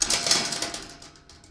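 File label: gate lock; Mafie 1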